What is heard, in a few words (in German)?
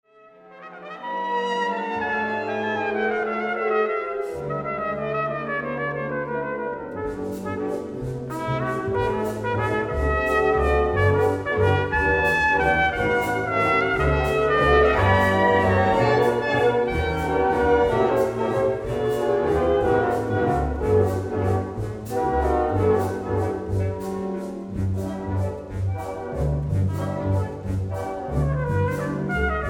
Trompetensolo